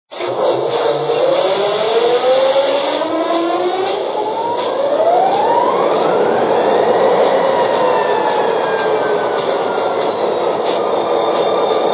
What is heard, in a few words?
GP40; RM